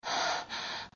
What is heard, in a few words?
Breath; Lewd SFX